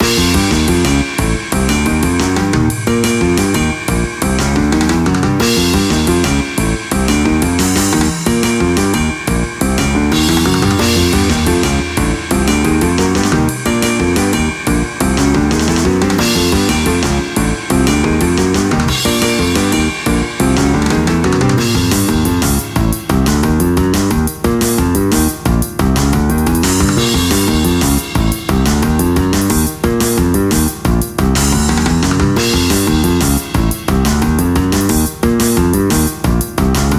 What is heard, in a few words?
Magic bpm 178